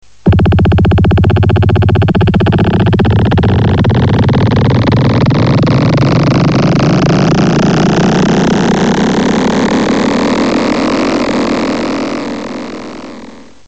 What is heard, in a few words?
Alien Lift-off